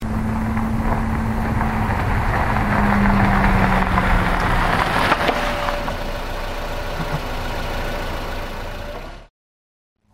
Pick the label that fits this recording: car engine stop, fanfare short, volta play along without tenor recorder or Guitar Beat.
car engine stop